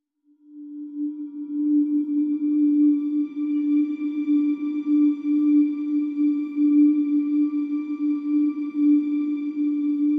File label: Pads Only